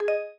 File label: connected; NVDA